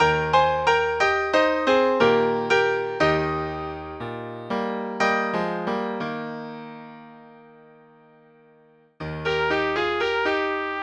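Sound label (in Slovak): pre trúbku a klavír; Hádajú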